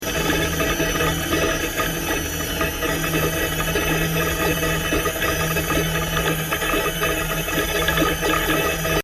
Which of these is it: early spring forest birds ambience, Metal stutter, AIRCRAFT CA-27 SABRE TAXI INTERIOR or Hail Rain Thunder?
Metal stutter